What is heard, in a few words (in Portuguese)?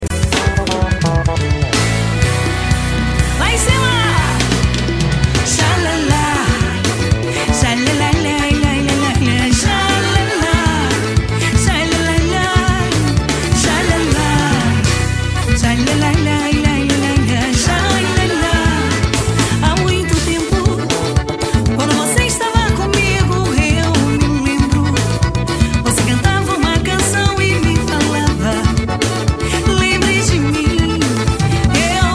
forro ao vivo